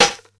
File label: rap steel snare drum a sharp key